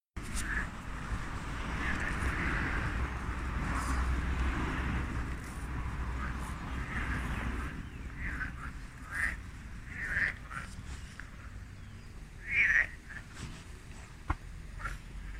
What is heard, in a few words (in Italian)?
primo gracidar di rane